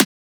Transient; FLPRO

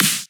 SnareClap; FLPRO

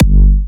Hitter G4, Big Room Kicks

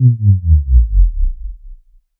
SubDrop Bold Gate

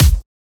Kick; FLPRO

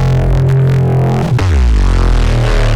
Long Bass

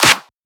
Shifted Clap; FLPRO

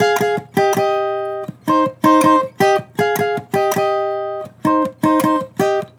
Acoustic Melody Loop 160 BPM F Min; Cymatics

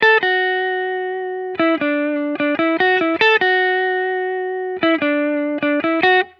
Electric Melody Loop - 150 BPM D Maj; Cymatics

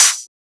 Open Hat; FLPRO

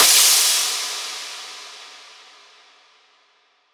Crash

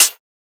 Closed Hat

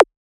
Percussion; FLPRO

